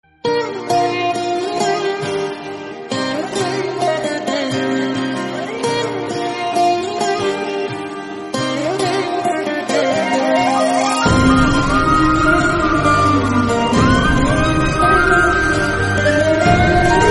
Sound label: Tamil ringtone; Raja Rani